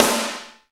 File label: SNR SUPER, snares; Roland L-CD701; Sn Modules 7